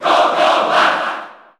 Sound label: Cheer Japanese; Little Mac; SSB4; SSBU